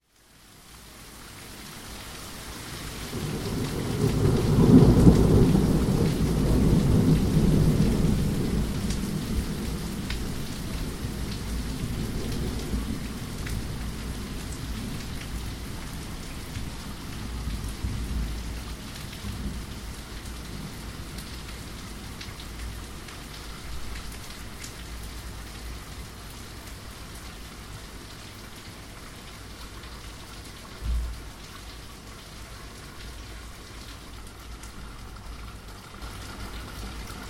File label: thunder storm